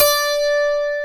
12 STRING D5, Acoustic Guitars; AKAI S6000 CD-ROM - Ultimate

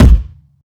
Hard Kick, Kick One-Shots; KJ Sawka - Drum n Bass & Beyond; Drum Tool Box

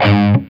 SOLDANO ROCK, Guitars; Spectrasonics; Hans Zimmer Guitars VOL-1

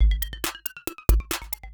percussion loops, 138, Techno

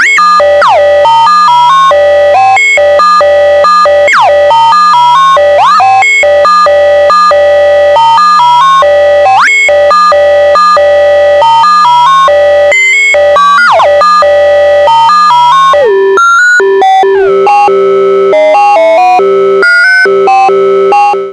ARP 2600, SEQ; TECHNO-BOX

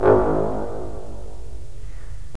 BRS, Roland LA Composer

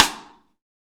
SN AMBIENT; Real Mega Drums

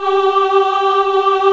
choir; 90s Sample CDs; Club-50 - Foundations Roland; Scats Choir

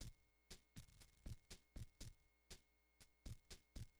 Noise Beat; Drums & Drones

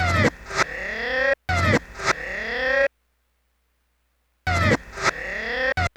synth FX, Hardcore Breakbeat; Big Fish Audio; Hardcore Breakbeat Action

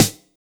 BEAT SD; B.BEAT

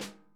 SNARE TAP; Sonic Reality; Interactive Drum Kits; ID SNARE 3+

